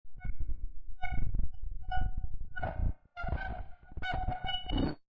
Glitch, Digital, Mechanical, Robotic, Computer Malfunction; Multimedia; Warped